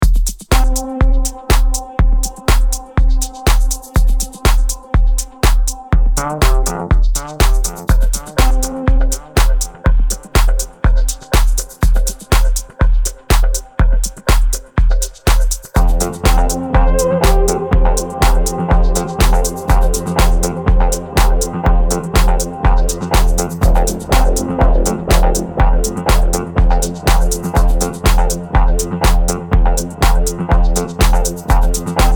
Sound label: Sax